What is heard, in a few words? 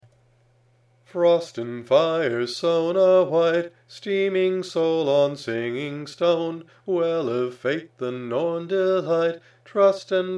Chant; Sauna Wight